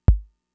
kick, Fricke MFB-522

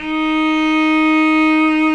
D#3 SOLO CELLO

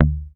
HOFNER MUTE, Bass

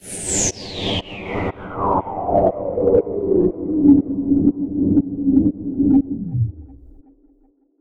Sweep; hiss crackle

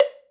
Block, cartoon caper, Percussive; musicradar

